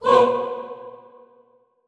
HOO B2, Classical Choir SHORT HOO; Best Service; Extended Classical Choir

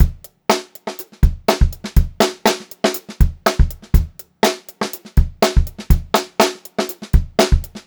Funk-Groove Drumloops, 122 FUNKY, R; USB Soundscan vol.38; AKAI; 90 s Sample CDs

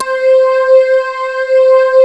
JV PADS; Pro-Rec - Synthsonic

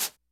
JAZZ KIT; DRUMS; Kid Nepro; Elite

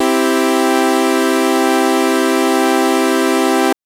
Synth SidSaw maj c3; TrashLibrary

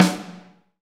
SD Real, Roland; Sound & Vision - Gigapack I

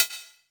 Cymbals, Elektron MachineDrum

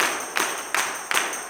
160 TAMB BRK; Blues & Soul

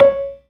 PERCUSSION; E-MU Formula 4000 Series Vol. 3; Analog Odyssey

